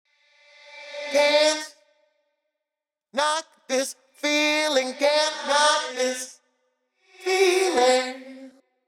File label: Reversed Reverb